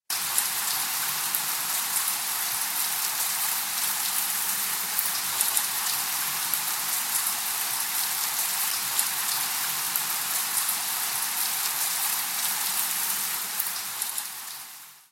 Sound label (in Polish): Deszcz